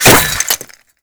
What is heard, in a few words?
impact masonry; Modern Warfare